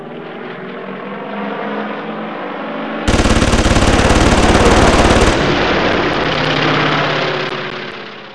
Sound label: weapon